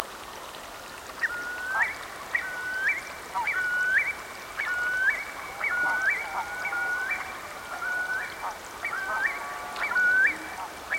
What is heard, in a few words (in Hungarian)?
nagy póling hangja